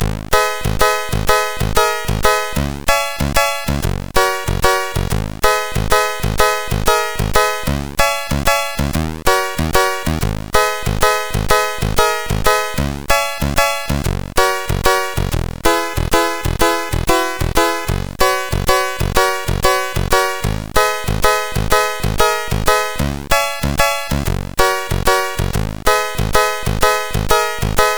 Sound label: Piano Rap, sid, CSG8580R5